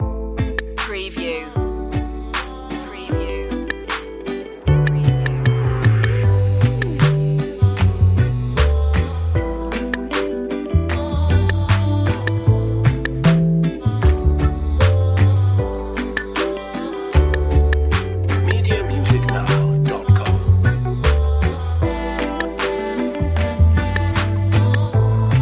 A warm and groovy electro track, featuring indie stylish vocals; chillout grooves